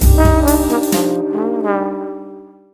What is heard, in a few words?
Puzzle Groovy